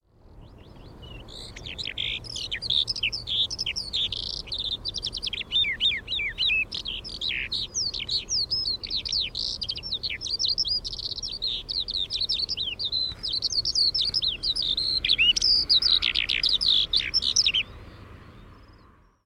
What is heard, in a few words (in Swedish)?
sånglärka; ZOOM